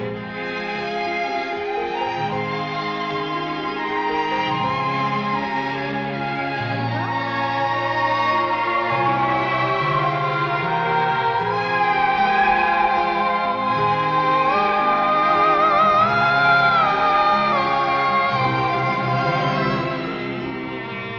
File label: strings and voice, Sad; loops